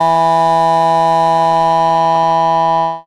Horns

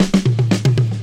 serenade fill, drum loops, rolls

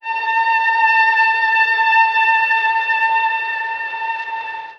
stringer hiss; Notes

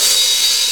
Hats Electro Open

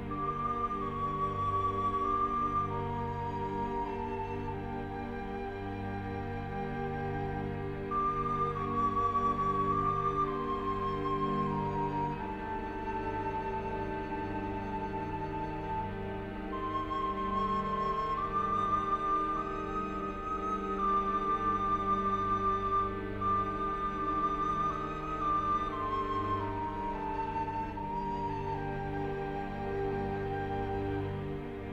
flute, Jazzmatazz loops

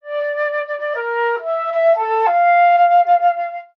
flute

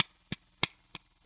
tape taps, drum loops

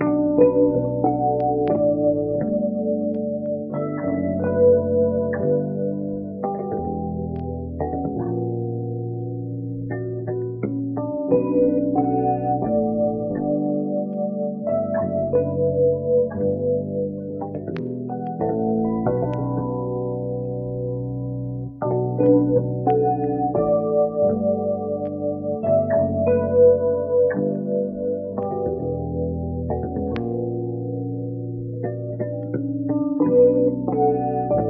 guitar, Loops, Sad

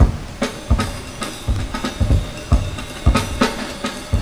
funky, drum loops

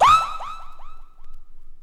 whoop female, voice; ooh ahh; ho hoo woo

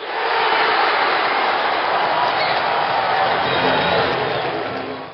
crowd cheers; ooh ahh; human

